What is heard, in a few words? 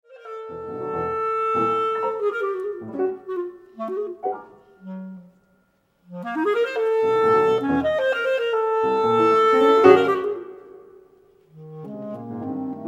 Clarinet